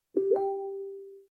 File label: new message